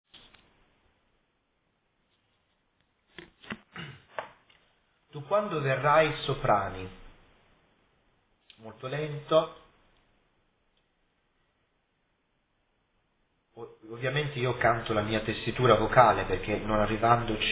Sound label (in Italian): soprani; Tu quando verrai